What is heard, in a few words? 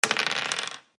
dice roll